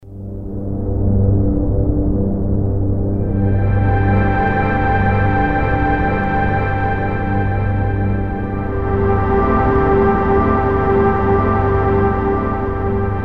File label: Pad: low echo, Emu Xtreme Lead-1